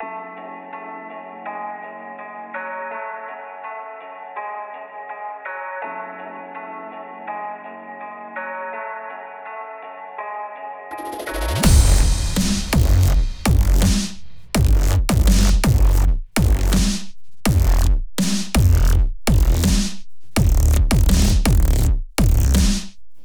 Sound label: Instrumental; Friday Night Funkin' Vs Marc Cea